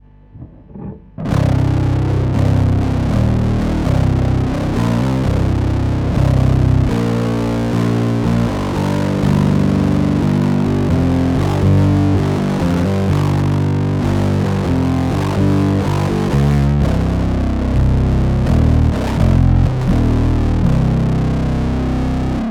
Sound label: muff Ge Si; diy